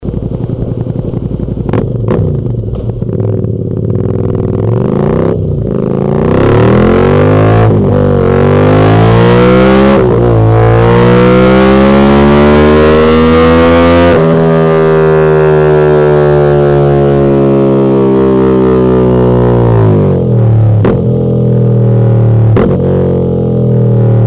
SUZUKI V STROM 650

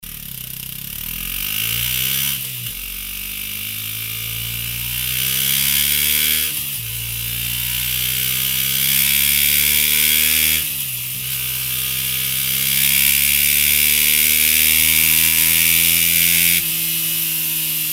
HONDA CBR 250R GP; 2011

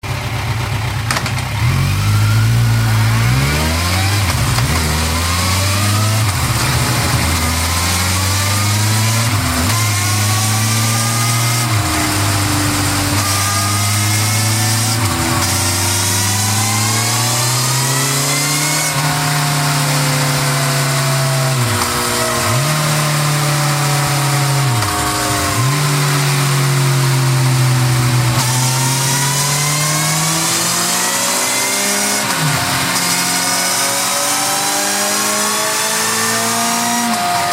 KAWASAKI VERSYS 1000 OVAL

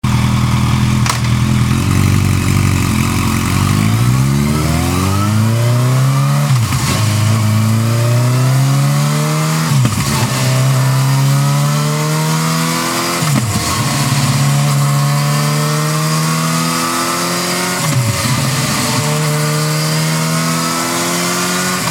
YAMAHA FZ8; 2010

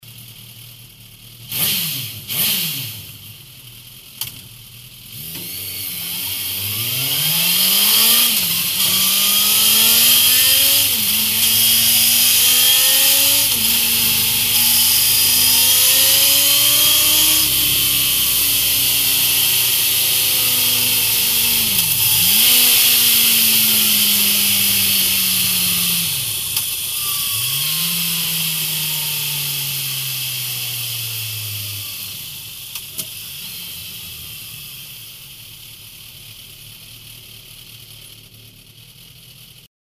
SUZUKI GSX S, exhausts; 2015

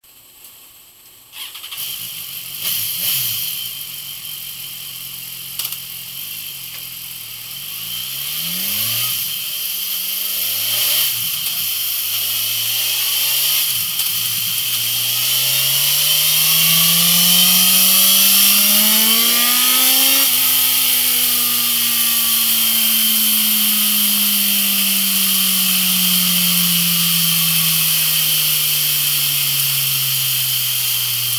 BMW S 1000 XR STOCK

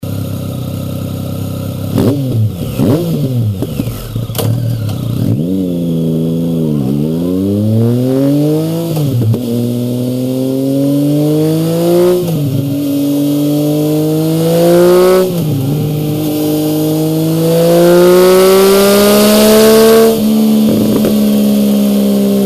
TRIUMPH SPEED TRIPLE; 2016; GP BASSA

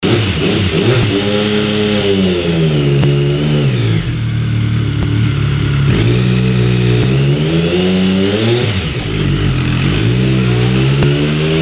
TRIUMPH SPEED TRIPLE GP, exhausts; 07-10

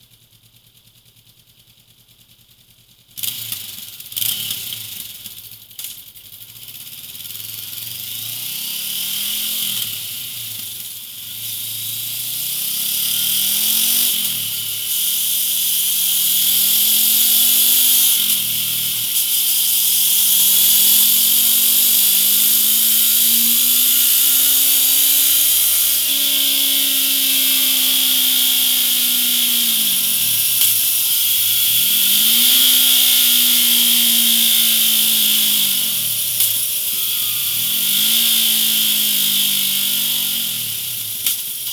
HONDA CB 500 F STOCK, exhausts

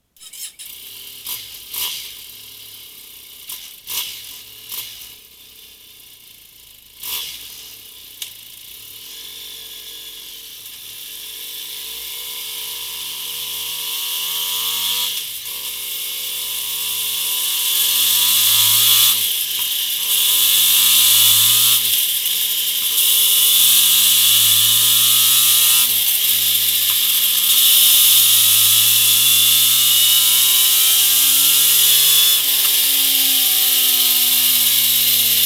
BENELLI TRK 502 STOCK

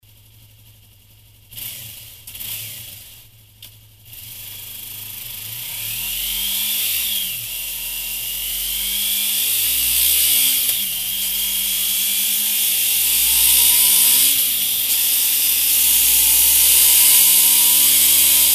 YAMAHA MT 03 STOCK

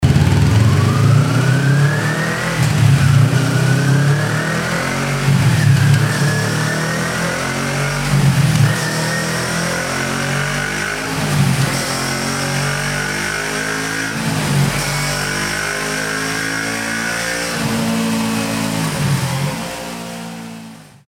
KAWASAKI NINJA 250R GP; 08

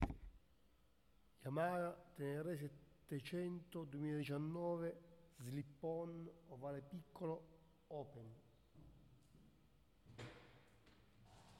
YAMAHA TENERE 700

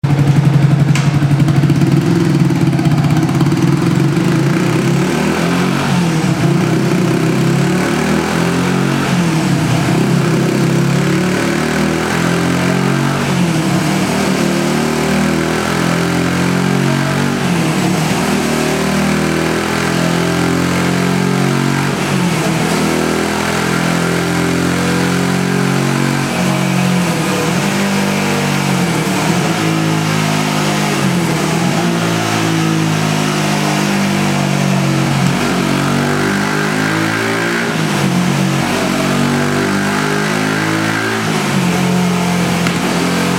YAMAHA YZF R125 GP, exhausts

